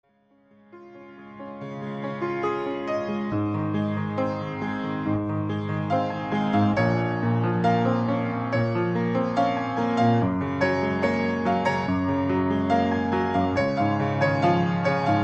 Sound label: Piano Cover